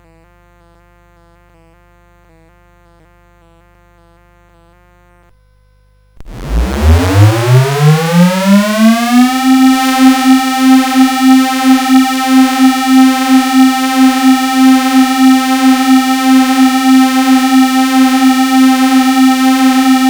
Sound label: Air Siren, CSG8580R5